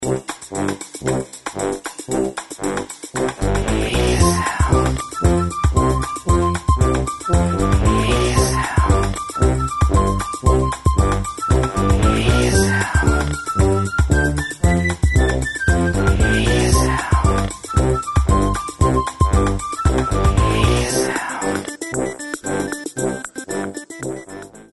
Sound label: Funtime - 115BPM